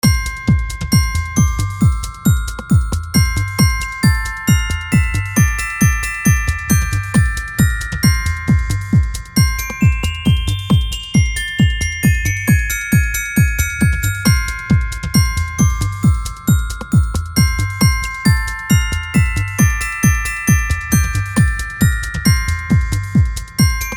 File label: Beat Upbeat Glockenspiel; Miscellaneous Loops